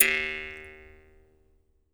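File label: Jaw Harps, Pitched Percussion; E-MU Producer Series Vol. 7 – Old World Instruments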